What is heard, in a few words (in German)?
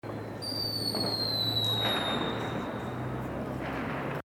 Silvester Pfeifer